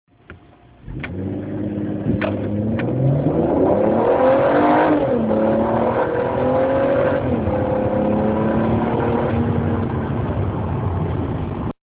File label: volvo s60 inside